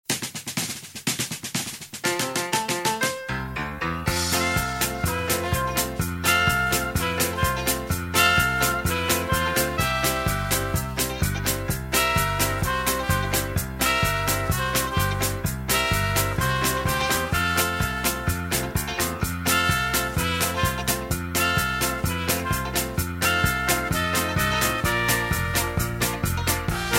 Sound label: music